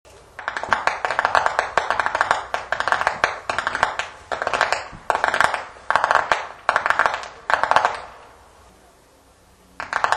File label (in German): schnalzen, flachgau; anthering